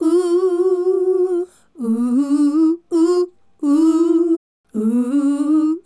Female Group, Hip-Hop and RnB Vocals

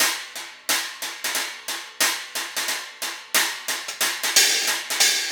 Hi Hat Pattern

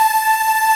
Ending loop

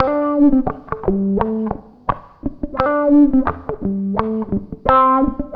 WAH, WAHWAHGTR; USB Soundscan vol.31; Miro s Grooves; AKAI

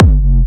BD G; Multi Drum Loop 17

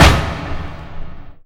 hit, percussion hit

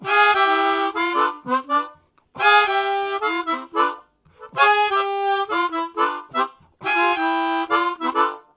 Chrom In, Harmonica, Riffs; Zero G

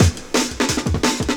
175, Breaks; Drum&Bass; EZ Rollers

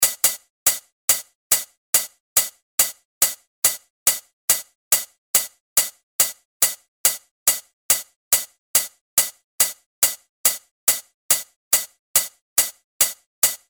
Off Hats; Industrial

